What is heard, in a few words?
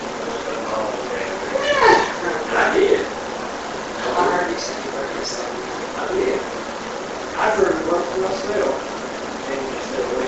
Unexplained Whispering or Chanting Amplified